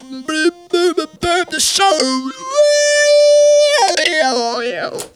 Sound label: Funky Vocals; USB Soundscan vol.18; AKAI